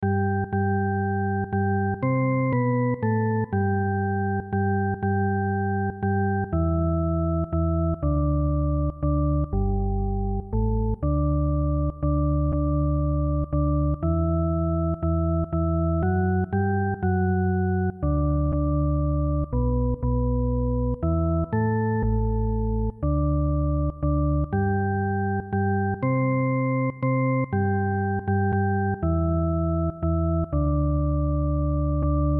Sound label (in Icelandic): bassi